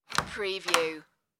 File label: light room door open